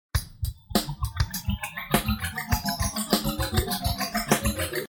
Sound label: combo drum